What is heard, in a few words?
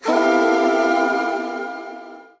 Layered Vox, female layered synth vox; VOCAL